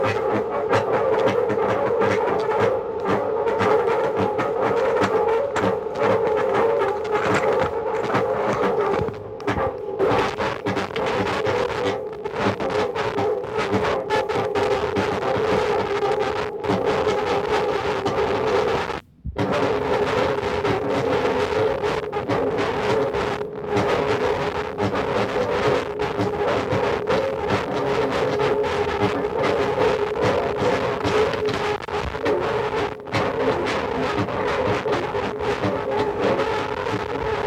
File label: Howling Music